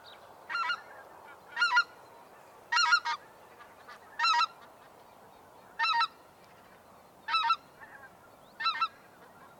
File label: Greater White-fronted Goose Anser albifrons; waterfowl